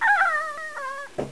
Ant Dying; Curse of Dragor

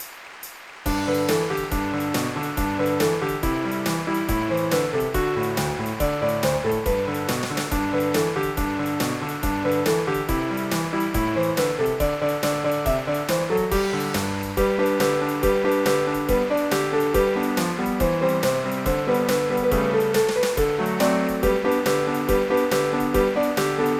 jingle

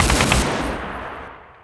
Gun; ImpGal2; groundwar

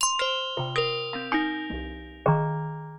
DRUM LOOP ambient 160

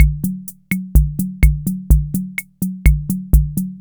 126 BENGUINE; maudio; Electro Crash

